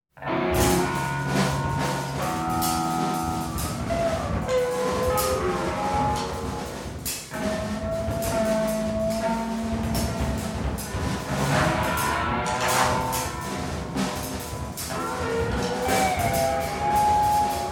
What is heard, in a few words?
Gtr Drums; Knitting Factory Works